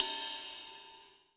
Ride; Vengeance Samples; VEE Cymbals

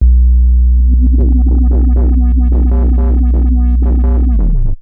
Roland B B1, Roland S100; musicradar-synth-samples

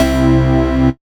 Bass C, Offbeat Bass

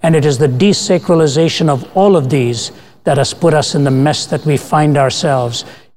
Vocal Phrases; Vengeance Samples; Vocals

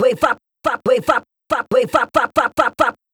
Vocals; Vengeance Samples; Special Sounds

